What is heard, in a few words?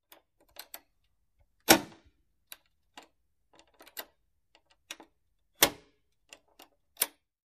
Metal Deadbolt Turns; latch, metal